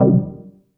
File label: Analogue Deep C3; Novation LaunchPad Mini MK2; ableton; Launchpad demo project 2014